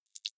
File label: click noise, signale